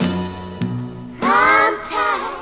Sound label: guitar, Loops